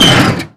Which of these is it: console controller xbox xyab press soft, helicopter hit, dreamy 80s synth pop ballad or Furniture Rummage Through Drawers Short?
helicopter hit